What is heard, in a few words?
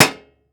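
Impact Hammer Hit Metal Shelf Small; Impacts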